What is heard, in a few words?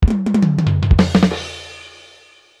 Drum Fill, Yamaha DD-35